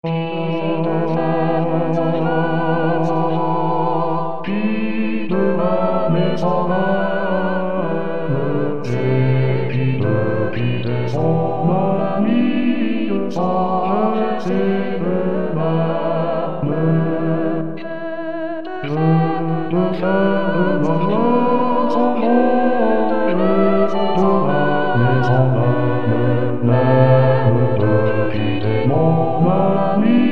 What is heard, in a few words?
bass